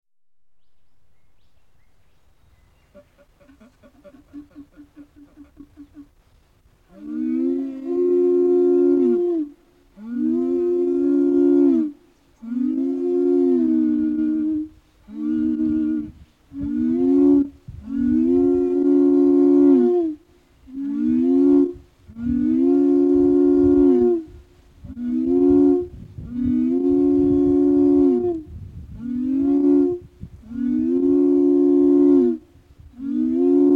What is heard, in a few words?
trumpet signal